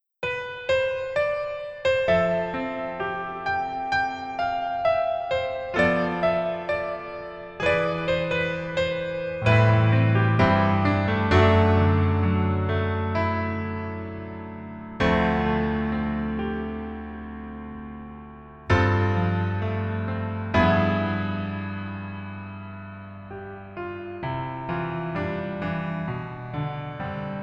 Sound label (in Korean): Piano ver 멜로디MR -1키